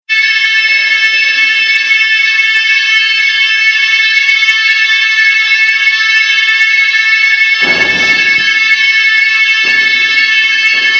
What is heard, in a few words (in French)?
Signal d'evacuation